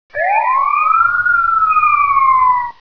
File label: siren; conduit